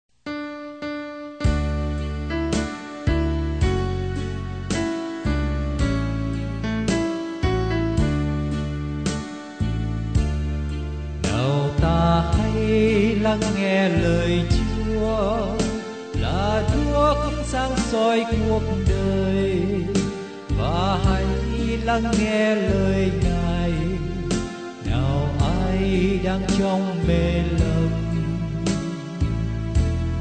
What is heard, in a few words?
BASS